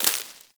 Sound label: Bullet Impact Debris Grass; Warframe; Weapons; Bullet Impacts